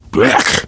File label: reaction disgusted, biker, voice